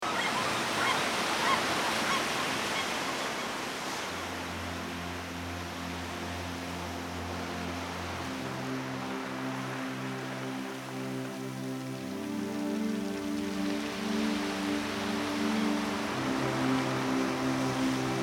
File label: Meditation